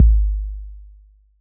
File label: BD 808 Decay